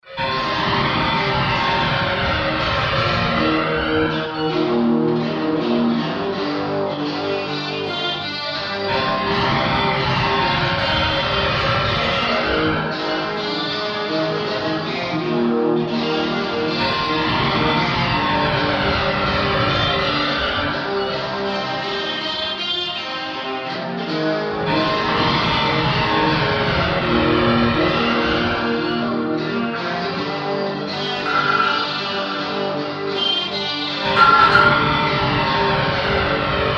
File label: instrumental